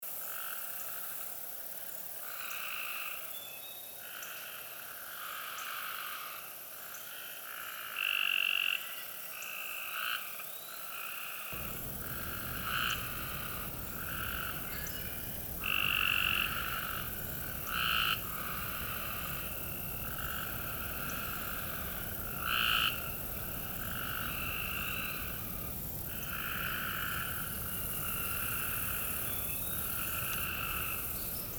Copes Gray Treefrogs, MD

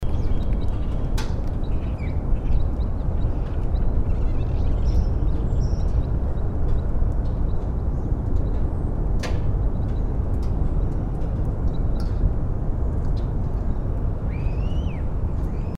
Red Crossbills; Snug Harbor Wo MD

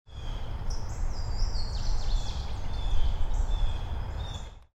Louisiana Waterthrush; Tuckahoe CN MD

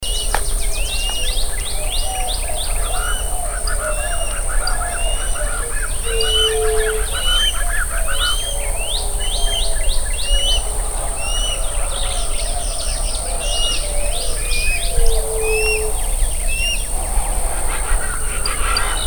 Harris's Hawk, Texas; Hidalgo Texas

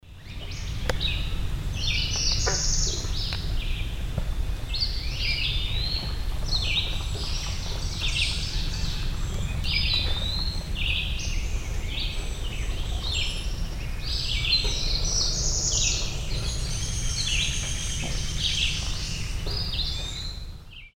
Northern Parula CERW-like; Nassawango MD

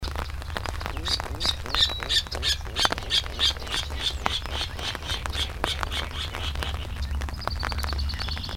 Virginia Rails Carroll Co Rain

